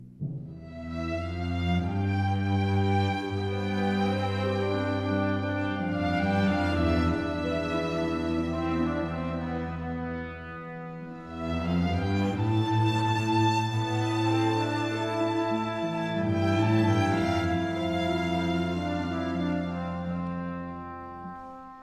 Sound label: orchestra